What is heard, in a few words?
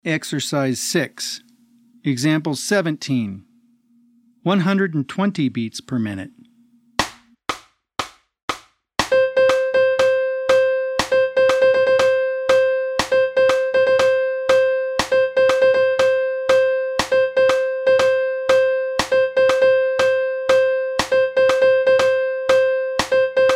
120 BPM